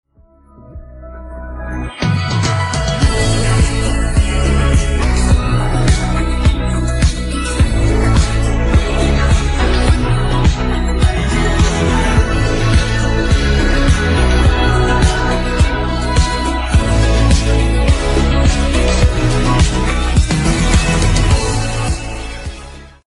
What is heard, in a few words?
Instrumental